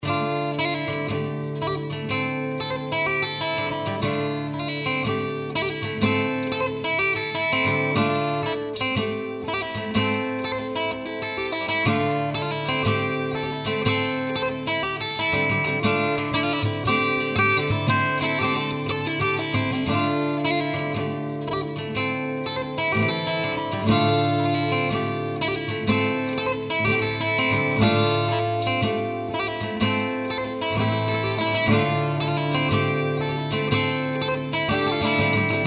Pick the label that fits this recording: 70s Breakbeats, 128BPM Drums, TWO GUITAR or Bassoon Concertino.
TWO GUITAR